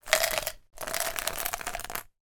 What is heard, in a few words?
food sweets smarties chocolate shells pour into tube from hand